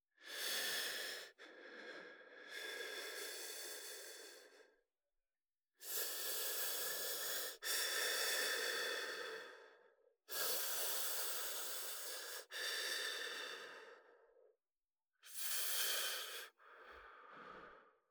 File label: HUMAN BREATH Male Deep Opened Mouth Breath with S Formed Lips Long Exhale; Articulated Sounds; Human Male Breathe